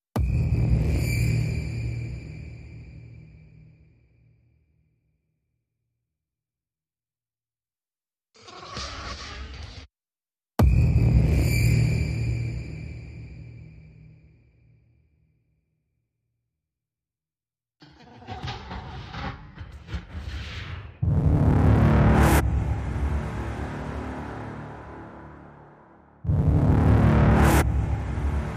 Perc Only

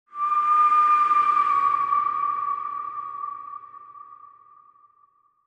Whistle Bend

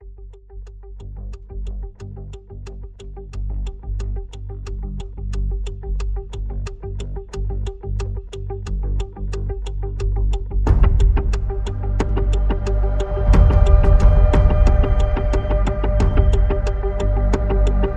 Framing X, No Bass Synth

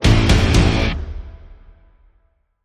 Stab Guitar Triple (A)

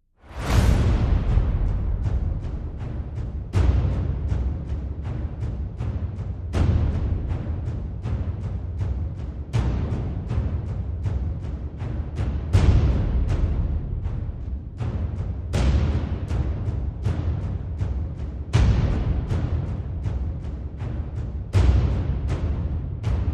Percussion Only

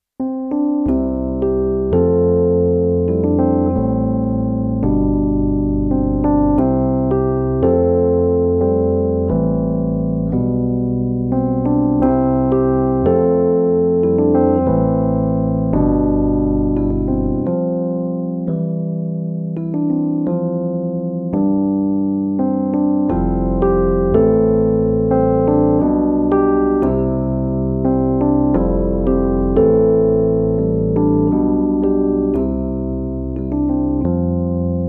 Rhodes

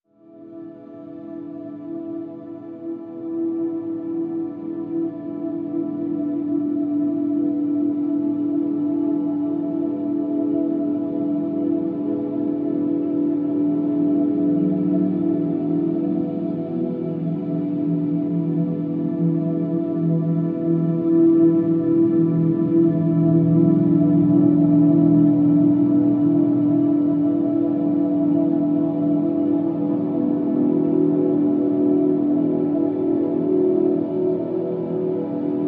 Pads Distant Lights (E)

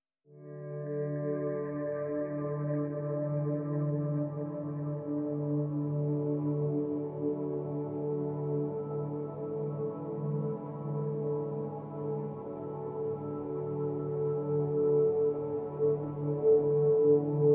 Pads Only

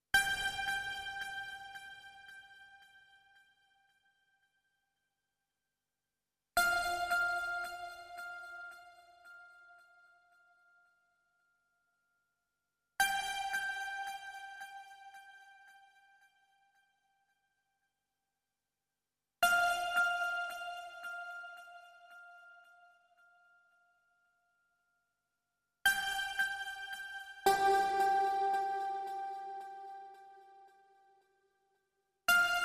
Bells

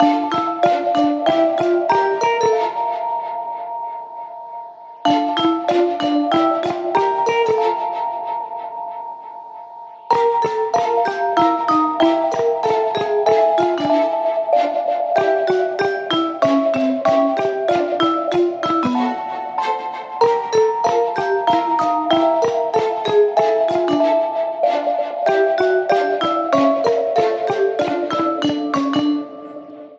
Jumpy High Jinks, No Drums and Bass; 30sec

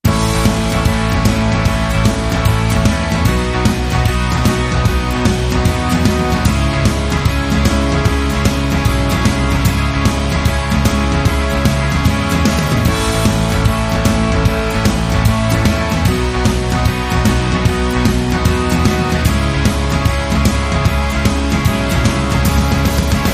No Lead Guitar

